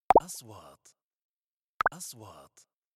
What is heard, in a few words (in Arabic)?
نغمة نقره هوائية; transitions